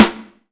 snare single hit a key, Royality free acoustic snare sample tuned to the A note